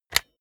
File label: jigsaw button off; maschinen; saege; soundpool